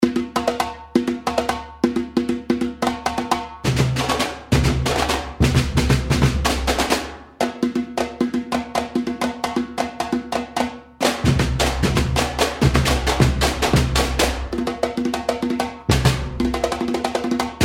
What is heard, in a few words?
Levada Call and Response, samba